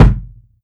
mid range kick single shot g key

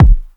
raw steel kick drum sample a key; kick drum samples

